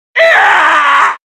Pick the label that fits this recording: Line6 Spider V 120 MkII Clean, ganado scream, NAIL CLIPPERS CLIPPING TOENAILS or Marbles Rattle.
ganado scream